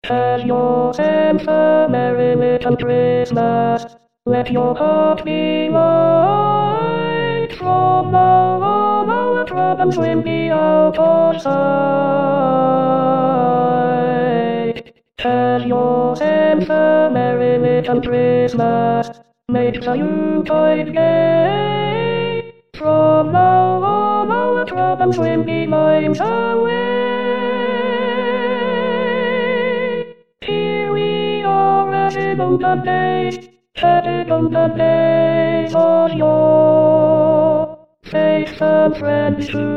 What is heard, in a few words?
Alto